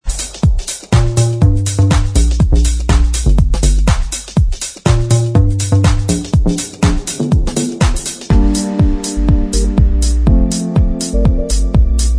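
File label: Instrumental Track, backing tracks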